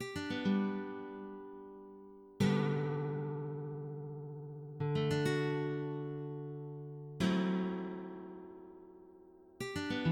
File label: Grace notes